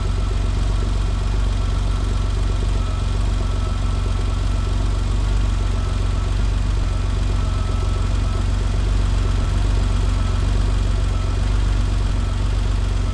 Porsche 911T Idle Loop; Automobiles

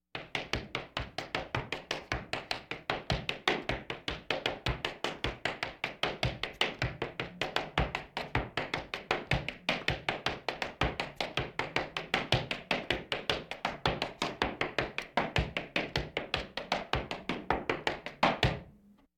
Laugh tracks

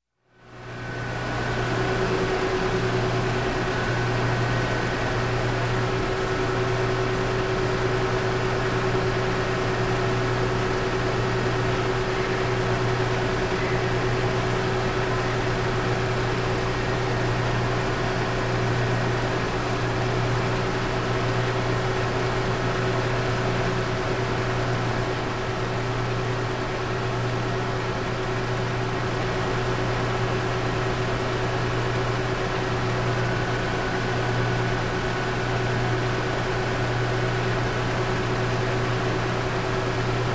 Power Plant Water Pumps Machine Ambience; Sound Ideas 2000 Series

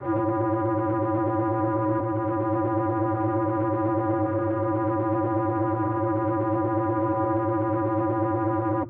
Pong Player Movement; Pitch Black Audio Pong

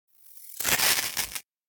Electric Arc Short Circuit Electric Shock; Hollywood Edge; sound designer's toolkit v2

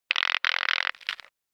Walkie Talkie Static End Of The Connection Noise; Hollywood Edge; sound designer's toolkit v2

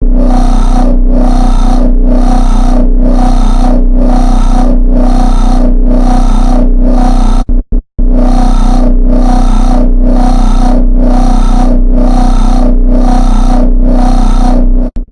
SUB Intensity, Cinematic Metal Impacts

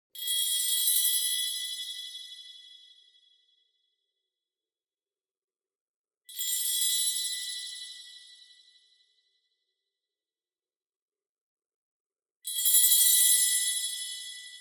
BLING Bell Dreamy; boom library; Anime Essentials

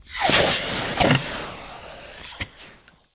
Steam Sizzle Hit

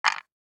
DICE on Wooden Tray, Drop, Standard, 6 Six Dice; Samples; ArticulatedSounds; DICE Sfx Party (Extended)

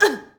Boxing Female Punch Grunt

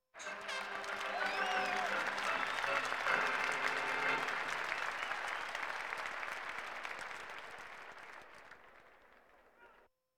Sports crowds

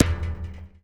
Street Ball Metal Back

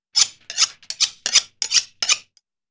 Sharpening Knife; horror sounds; Hollywood Edge

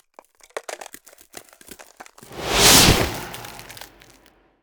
Ice Spell Whoosh, Cold Whooshes; Arctic Aura

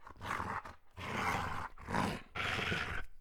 angry breath dog; Apotheorasis

